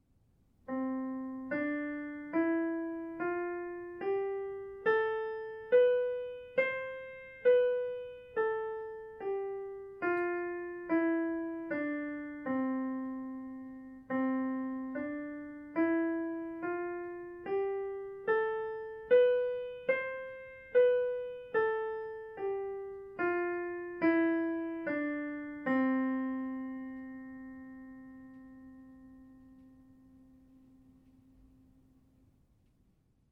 Scales expand into triads

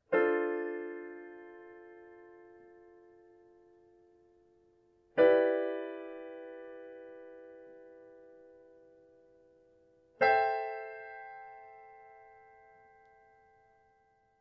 Minor Sevenths

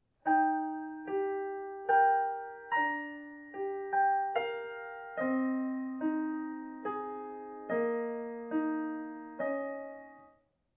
Simplified transition

